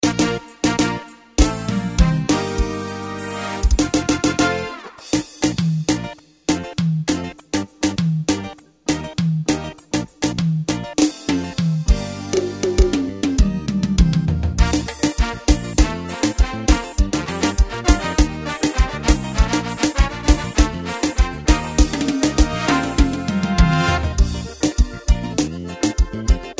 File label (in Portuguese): sertanejo playback